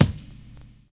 ATMOSPHER; Univers Sons

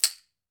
CAXIXI HI, Latin Shakers

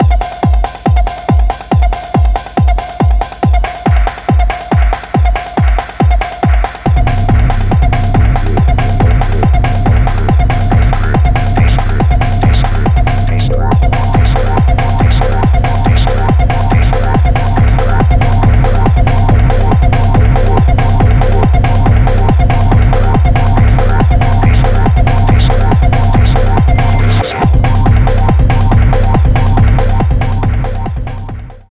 Mix, Club Techno, 140 BPM; Zero-G; Construction Kits

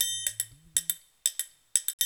HMM, 120; Spectrasonics - Supreme Beats - World Dance

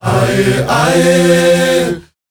AH YEH, S. AFRICAN, World Voices; ILIO; Vocal Planet VOL-5

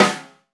RMSHT, 30S JAZZ KIT; Northstar - New Gold VOL-1

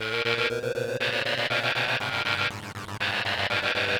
Kaossilator processed (120BPM); musicradar

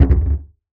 Percussive Hit, contact mic

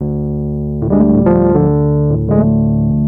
Rhodes, Keyboards; Total Drum&Bass

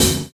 Hi Hat; Sonic Foundry (Sony Creative Software); Drums & Drones; Construction Kits; Pace Kit

